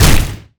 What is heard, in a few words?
Black Hole Hit; Bolt